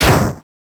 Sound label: Bomber Explosion; minigame; weapons; player; super weapons; Warframe; Caliber Chicks